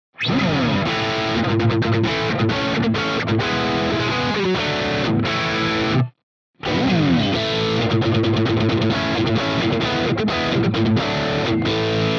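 Jackson PRO PLUS Soloist SLA3 Rhythm Riff all positions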